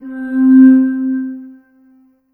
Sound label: SYN, 180 BPM, Drum & Bass; Ueberschall; Drum & Bass Flash Trackz